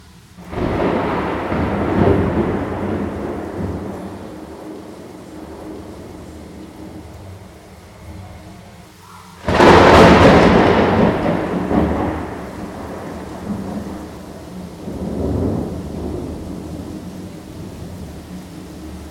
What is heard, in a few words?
thunder